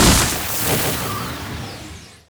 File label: Ice Arrow; Fly For Insanity